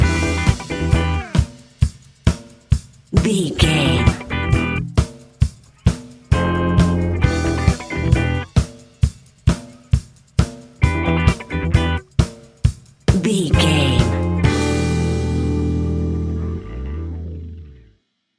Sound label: A fast paced piece of electronic dance music; perfect for the nightclub, and a youth orientated visual scen